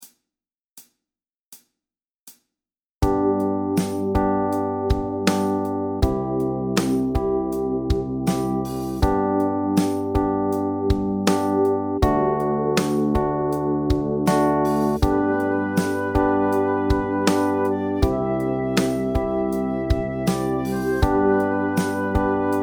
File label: Bass G1